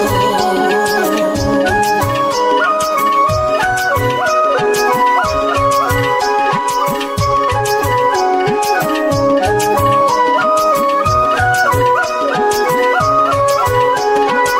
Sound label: Instrumental Flute